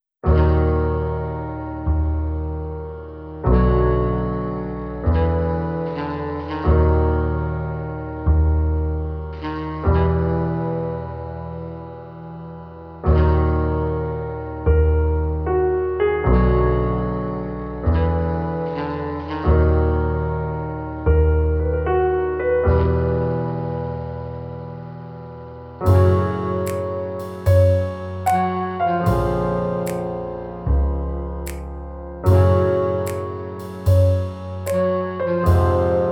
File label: Dark Jazz Club; Grand Battle